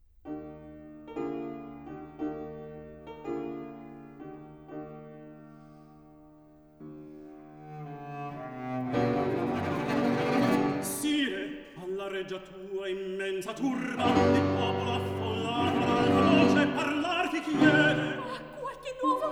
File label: opera, Recitativo